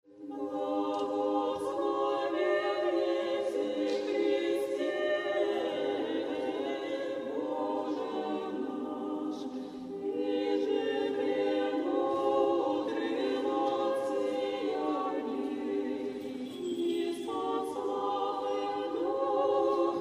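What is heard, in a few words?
tropar Holy Trinity Greek chant; sv. troice; grecheskiy raspev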